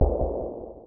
small explosion; Powermanga

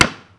ball steel; Crazy Machines; Играет вся семья

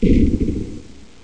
big explosion; Powermanga

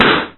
balloon; Crazy Machines; Играет вся семья

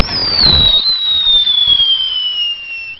rocket fire; Crazy Machines; Играет вся семья

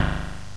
guardian fire; Powermanga

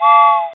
wagon; Ri Li

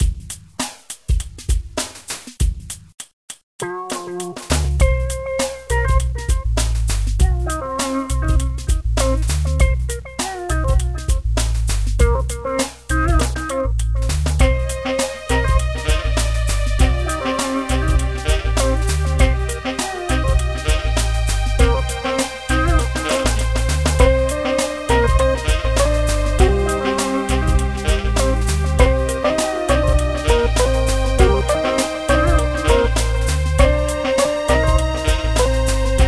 music game, handheld console; Powermanga

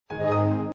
unlock, Phones; E398 phone

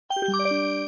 Stopwatch; E398 phone

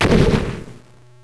big explosion; Powermanga

